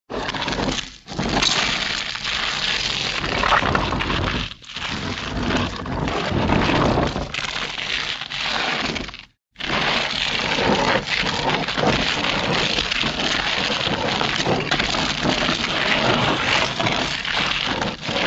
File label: drag a big stone